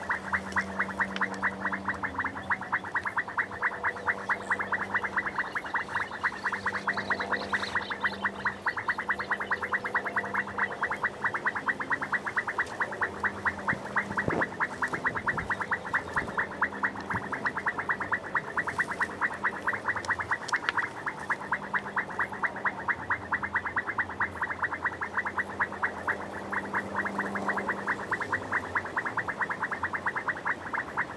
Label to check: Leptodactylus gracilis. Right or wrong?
Right